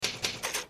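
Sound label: type sound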